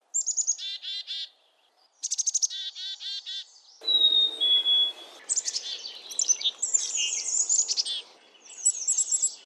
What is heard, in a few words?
CHICKADEE BLACK CAPPED; species/birds; wbba